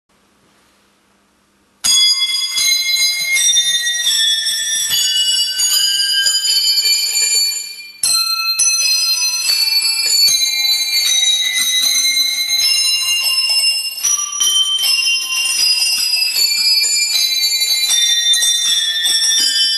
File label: brass